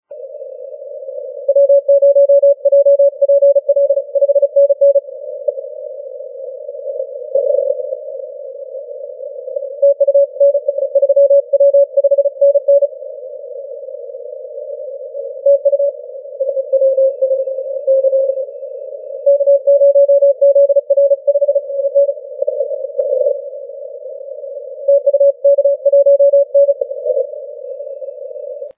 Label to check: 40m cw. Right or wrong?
Right